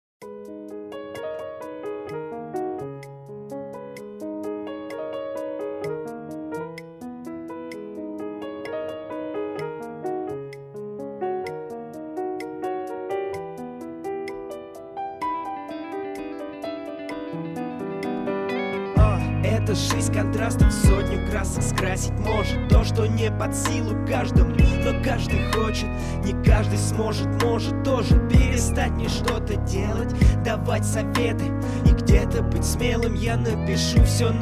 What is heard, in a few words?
instrumental